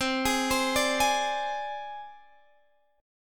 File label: C Suspended 2nd Sharp 5th C Guitar Standard; arpeggiated; JGuitar